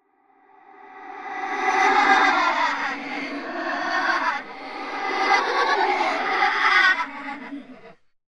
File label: HALLOWEEN CHILDREN LAUGHING REVERSE DELAY